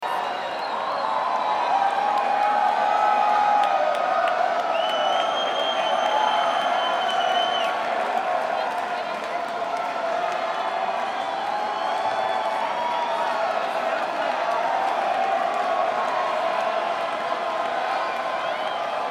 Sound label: Crowd